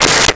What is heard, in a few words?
Walkie Talkie Static; Communications